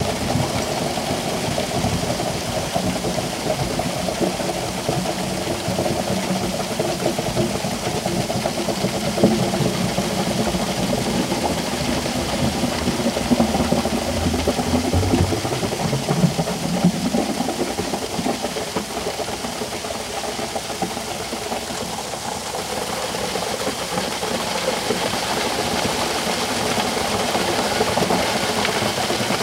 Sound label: Cement Pouring Plopping Down